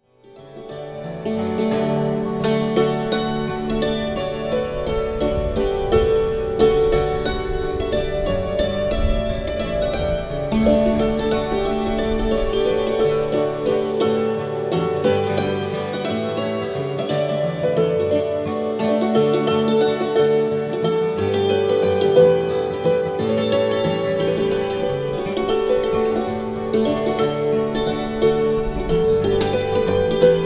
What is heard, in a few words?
Peekaboo Waltz